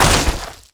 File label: grunt gravel land